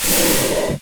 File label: door move; Dystopia; doors